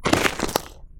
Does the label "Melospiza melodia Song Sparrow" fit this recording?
No